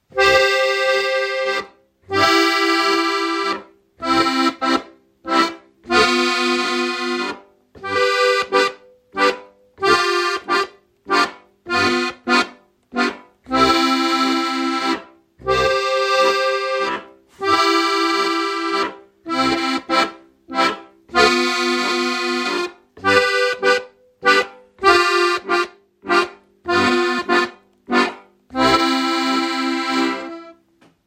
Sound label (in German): Harmonika